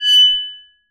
Arco Metal Attack Medium F#5; Multisamples; Sound Objects; Ableton; Live 12 Lite; Core Library

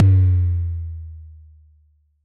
Tom Synth; One Shots; Drums

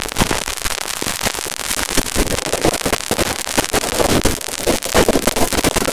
Noise Vinyl Dirt Constant; Ableton Live 12 Lite; Vector; Sprites

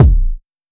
Kick Heavy

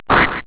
hit

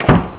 OLD HOUSE; Canon CameraWindow

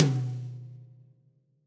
Tom Stick Hit Hard Bright Room; Drums

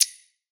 back, menu

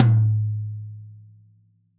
Tom Rack Stick Center, Drums

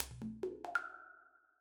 Textures Bubbles R, impulse responses; Ableton Live 12 Lite; Hybrid

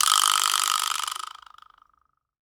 Wood Vibraslap

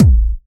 Kick Burst

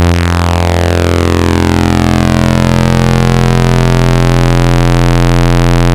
Pulse Dual, Vector Sprite; Ableton Live 12 Lite; Sprites

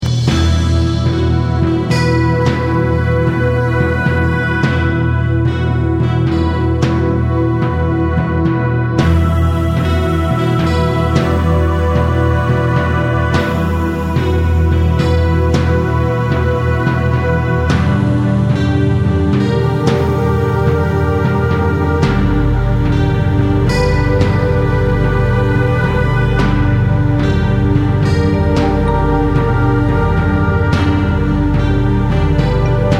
Witch Drums

